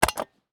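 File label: Large Push Butten Switch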